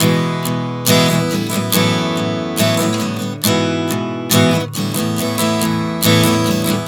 Progression 070 E-F#m11-G#m-Asus9, Acoustic Guitar, Chord Progressions 070 bpm; Sonic Foundry; Songwriter Acoustic Guitar Companion